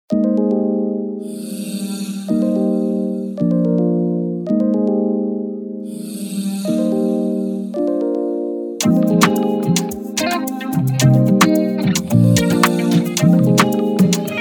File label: Afro house, Type beat Instrumental